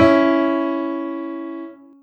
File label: Two C# E4; osu